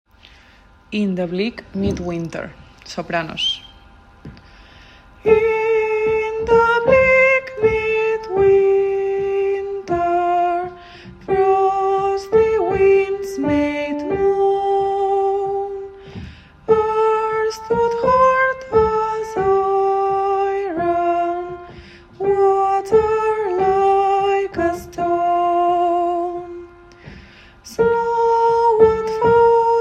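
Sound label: midwinter soprano; In the bleak midwinter; Christina Rosetti y Gustav Theodore Holst; Navidad JOCSMAB 2023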